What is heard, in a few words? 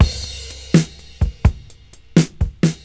CYMB, Breakbeats